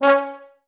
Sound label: TB STAC C#2, Advanced Orchestra; Best Service; Peter Siedlaczek's Advanced Orchestra